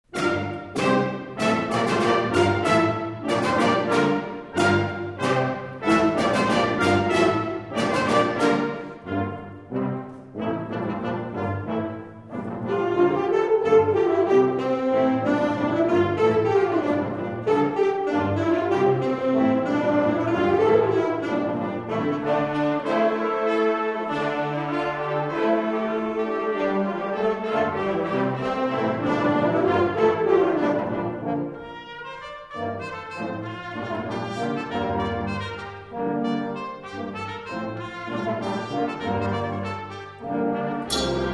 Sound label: Symphonic Band; Wylde Lake HS